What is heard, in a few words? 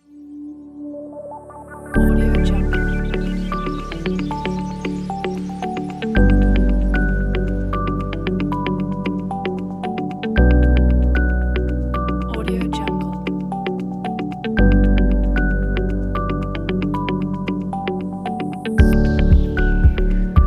soft and bright